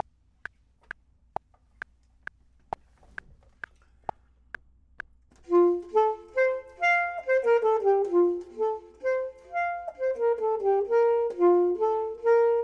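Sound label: bottom, Saxophone and Clarinet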